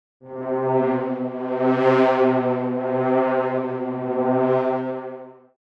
Horns Atonal FX Seasick Bend

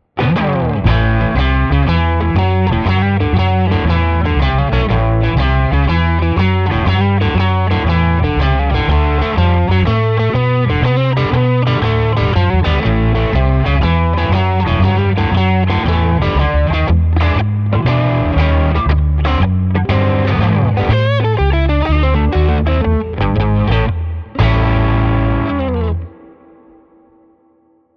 Blues

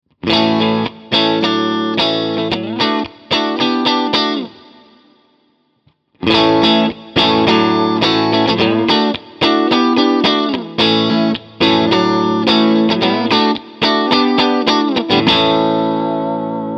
Engl Fireball 25 Clean Singlecoil Mid Boost on

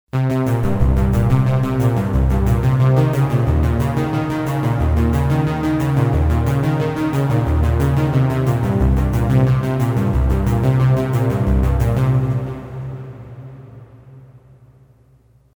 Cypher2 SQ Neon 1980s

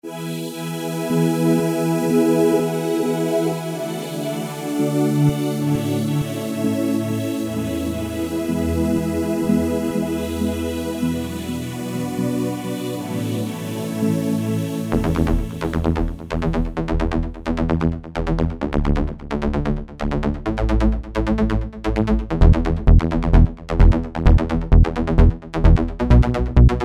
dim elec sounds

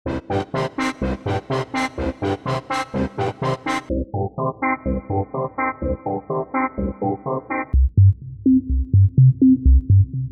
Tone Colors Detail; Tracktion Abyss

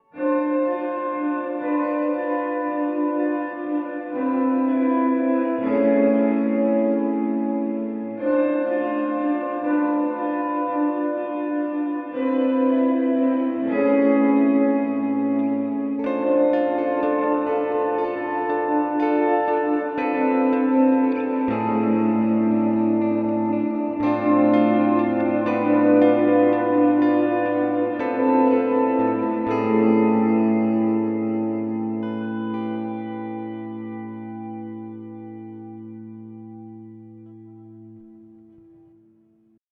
Mooer R7 X2 Church

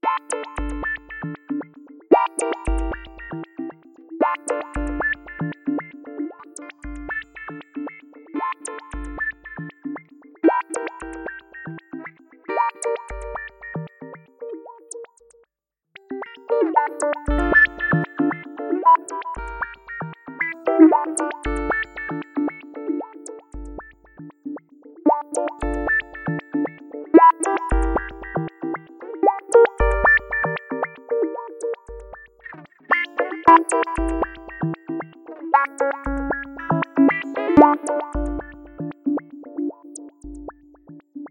Sequencer Guitar Rig

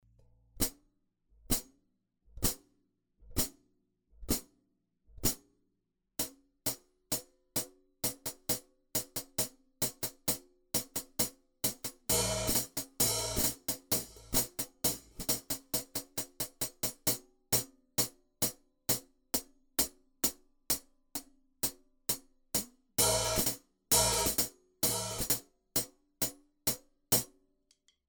Hi Hat

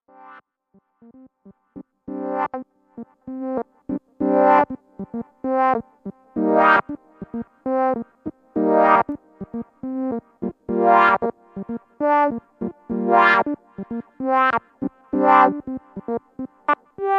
ps3100 short env

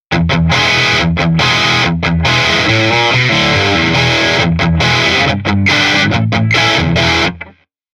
Solo Guitar; GR2 Workshop

